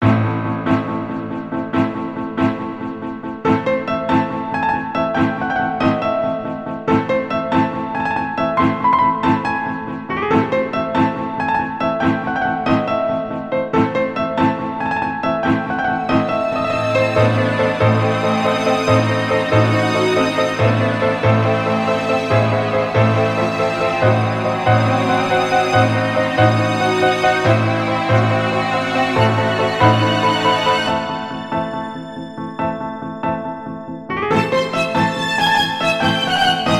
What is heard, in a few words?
Strings Concerto ~feeling of impatience; Kurai Mirai 2; Mirai Series